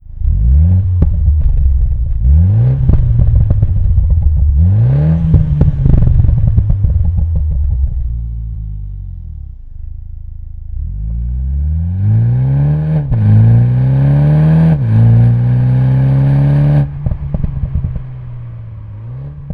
Mini Cooper JCW OPF Axle Back System; REMUS

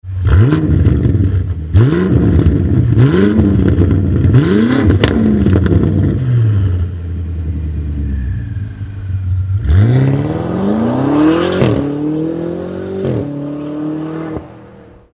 BMW M5 F90 Axle Back System

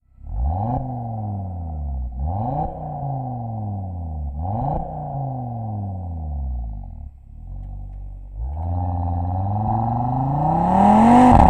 Audi RS3 OPF VSD Ersatzrohr+ESD; REMUS

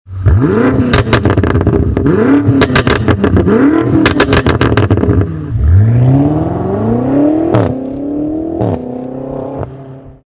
BMW M5 F90 CAT Back System, ringtones; REMUS

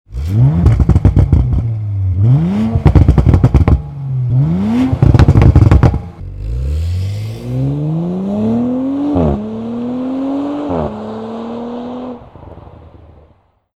Audi RS4 OPF Axle Back System; REMUS

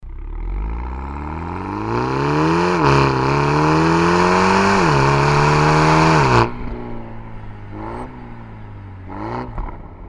Mini Cooper JCW Clubman OPF Turbo Back System; REMUS

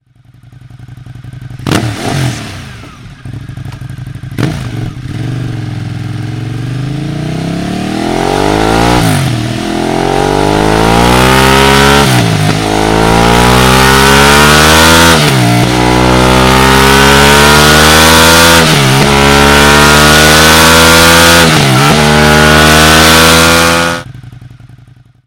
Ducati Monster 1200 S

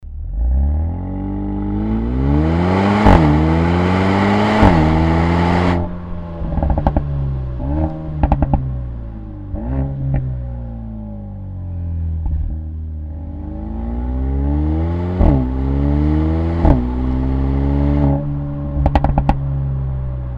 Mercedes AMG A35 OPF Back Racing system; REMUS

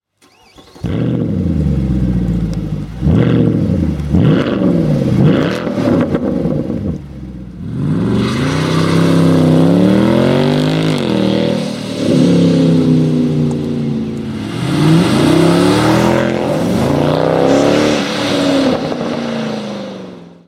Mercedes Benz C63 AMG

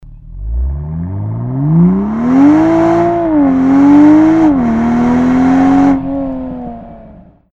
Toyota GR Supra RACING Axle Back; REMUS

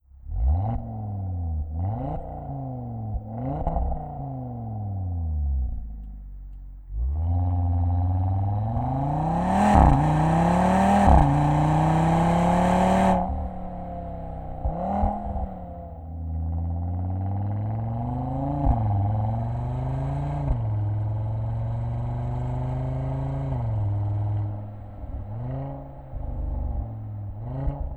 Audi RS3 OPF VSD+ESD; REMUS

